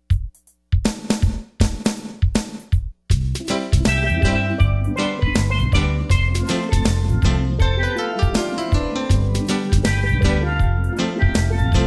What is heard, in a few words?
Steel Drums